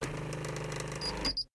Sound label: Door light